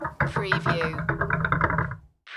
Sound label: Lamp Rattles